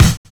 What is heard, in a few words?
kick, Drum and Bass